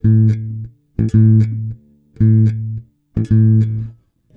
110 Pop Basic Bass A, E-Bass; Pop Rock

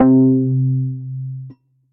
Key Bass, Piano & Keys

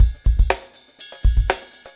122 Funky Drums Ride; R&B Funk Soul

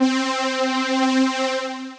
Sparkpad, Synth Pad; Ableton; Beat Tools

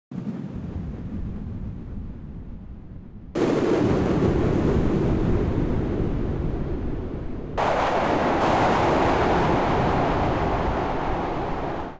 Distant Thunder FX 80 Bpm; MIDI Clips; Instruments; Ableton; Drone Lab

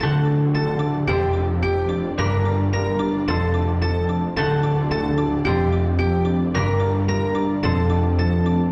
Rousing Piano - DMaj 110 bpm; MIDI Clips; Tonal; Motifs; Ableton; Mood Reel

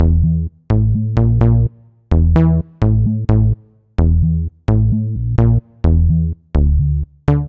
Bass of Velvet (E) 128bpm; Music Loops; Ableton; Singularities

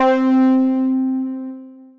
Acid, Synth Lead; Acid Fertig; Ableton; Factory Packs; Punch and Tilt

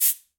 Cabasa Long; Latin Percussion; Drums; Drum Hits; Shaker; Ableton; Factory Packs

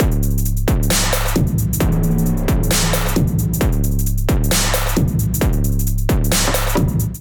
Melodic 133 bpm; MIDI Clips; Drums; Youngstar Kit; Skitter and Step; Ableton Factory Packs

